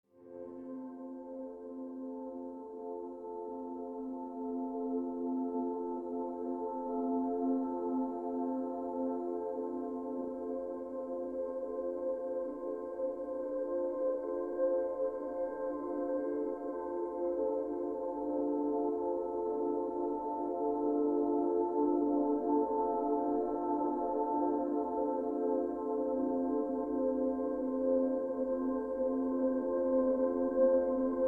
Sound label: Delta Waves, Ambient